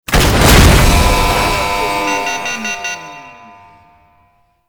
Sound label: Elevator Slow; Warframe; Jade Shadows; Ascension